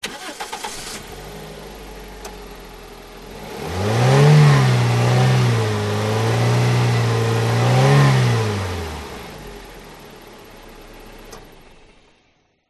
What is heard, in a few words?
vehicles car start rev off short toyota corolla front radiator pov; zapsplat